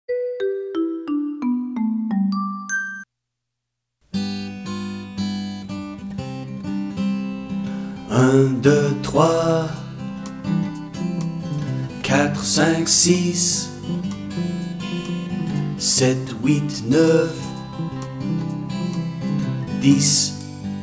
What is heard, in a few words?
Numbers Song in French. Une Chanson des Chiffres.; children music